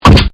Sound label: impact airgun; Collection Synesthésie; Isabelle Grosse